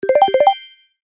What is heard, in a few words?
cash out medium